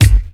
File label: 90s crisp hip hop bass drum single hit g sharp key